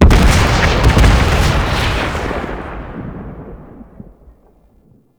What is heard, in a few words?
Heavy Orbital Strike Cast Fire; Abilities; Warframe; Tau; Dax Heavy